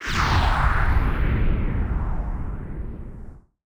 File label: Blast, Explosions